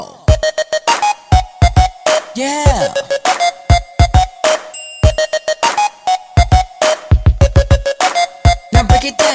Best Hiphop Msg

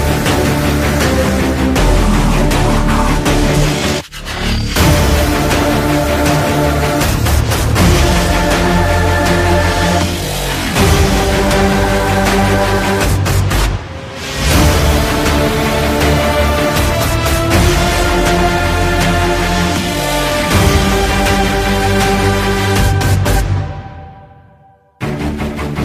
Game Music

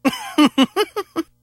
Claw Throw; Vega

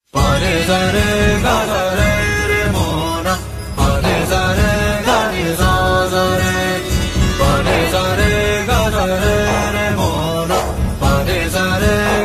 Song

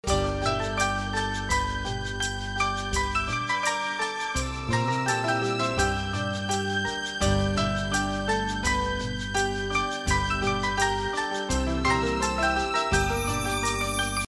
Great Piano Tone

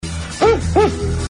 HOOT HOOT

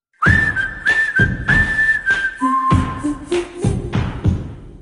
Msg Tone; Nanban

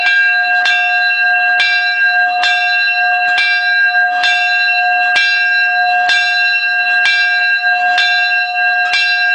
Antique Train Bell

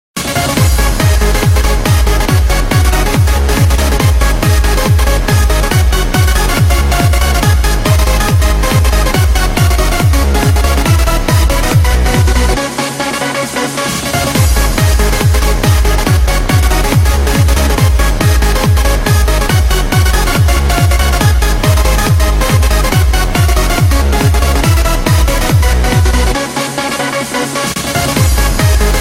Awesome Techno

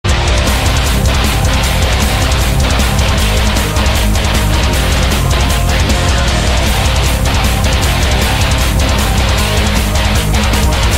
Battle Song

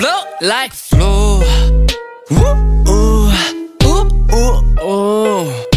Blow it like a flute

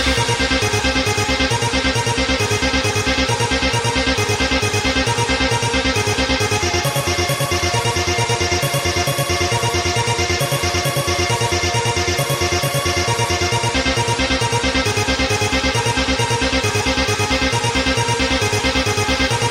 Acid Phase

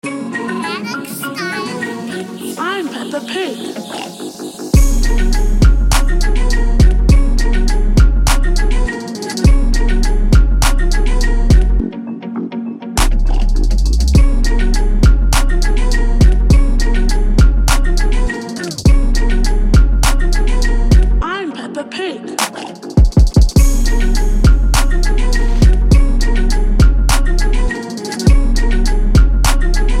Trap

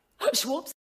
Swoopsie

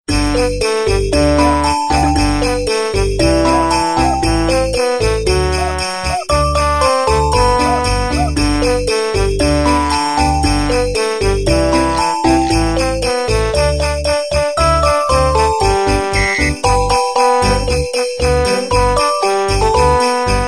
Jingle Bells

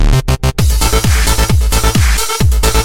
Dance Sms

